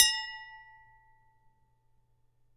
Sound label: Glass A ff